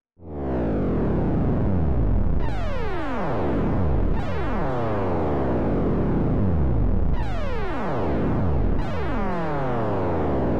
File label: xor pwm